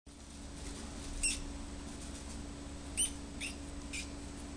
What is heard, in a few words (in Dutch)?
jongen geluid, russische